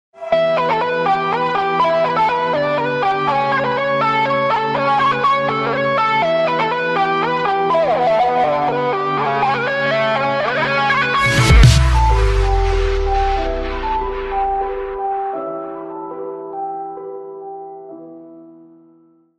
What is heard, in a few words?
nokia theme 2 ringtone